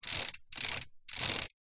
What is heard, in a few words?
vehicles bicycle pedal backwards short wheel hub clicks